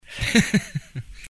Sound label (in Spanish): risa